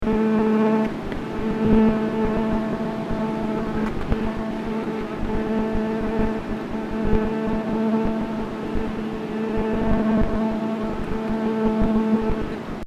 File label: mosquito